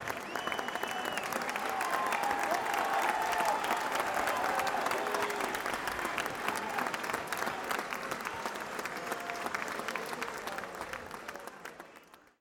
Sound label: Crowd cheer and clap